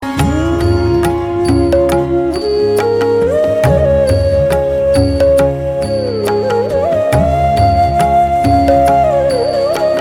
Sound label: Flute Tabla Manjira